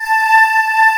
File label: DIDI PAD, SYNTHESIZER